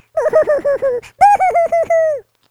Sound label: boohoo; smartpandademo